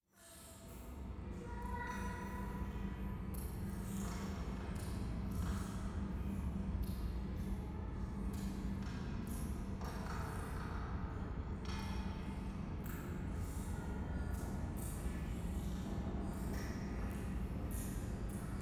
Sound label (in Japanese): 廃墟 イラマチオ 電車